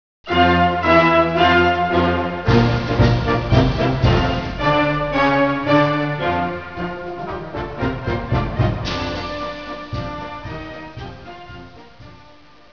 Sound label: radio march